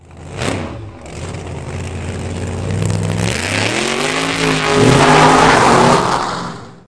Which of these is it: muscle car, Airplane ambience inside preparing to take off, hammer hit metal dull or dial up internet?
muscle car